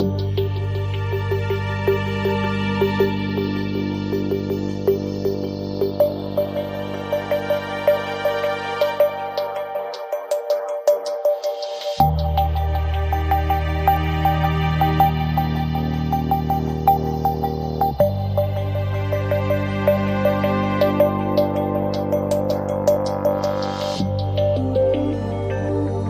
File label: Chill No Drums Loop